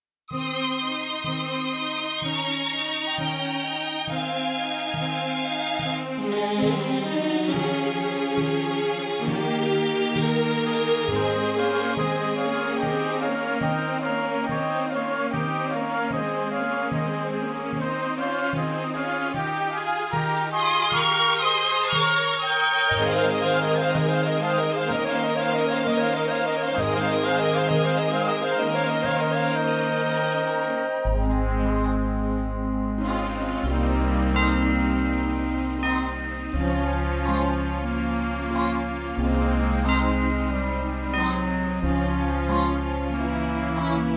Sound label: Key of A Flat Instrumental